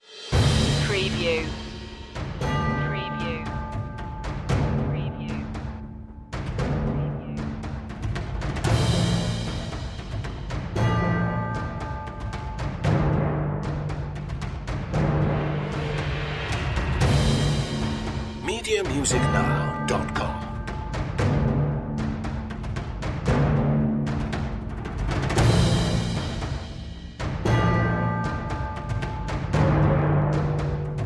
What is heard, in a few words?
perc mix high intensity